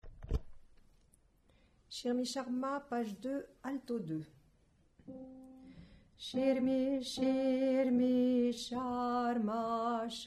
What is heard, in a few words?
alto; Xirmi Xarma